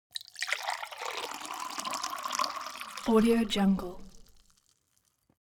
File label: elements water glass pour hard; smartsoundfx